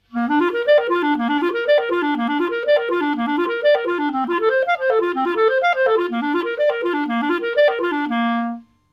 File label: Clarinet Scale